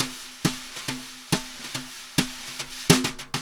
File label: Drum loops; Just Add Drums